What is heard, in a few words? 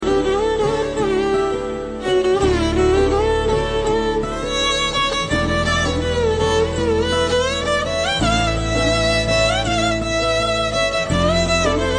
Instrumental Ringtone